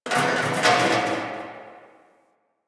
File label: Garbage Can Rattle; Warframe; Nineteen Ninety Nine